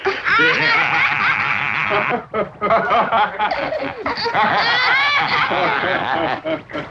laughter group

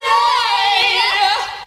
"yeah" SUNG

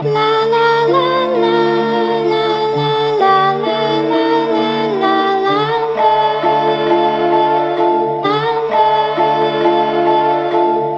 voice keys, Loops, Sad

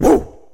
woo

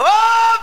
"oh" SUNG

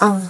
uh

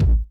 elongated, Kicks Electro

